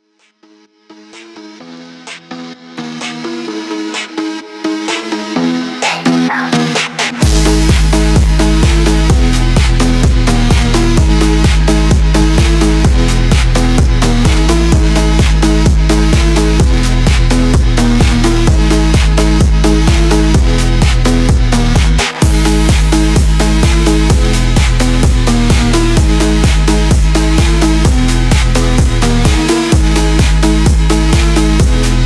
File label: phonk house